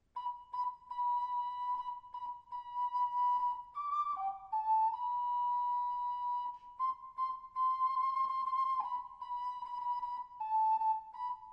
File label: Jingle Bells fade, ocarina